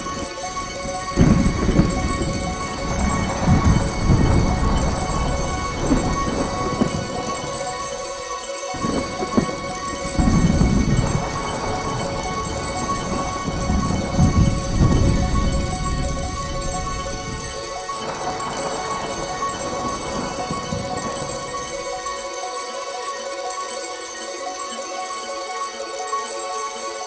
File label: big Win Loop; netent